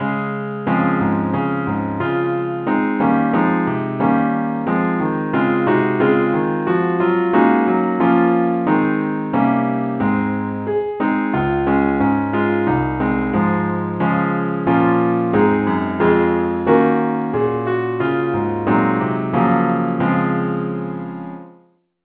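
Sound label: keyboard only key D flat; saintthomas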